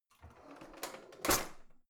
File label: old drawer creaky slide out